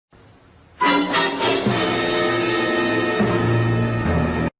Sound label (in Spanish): Taaan taan taan Efecto de sonido